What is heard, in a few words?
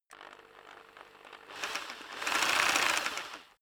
rock drill hammer idle rev; maschinen; bohrer; soundpool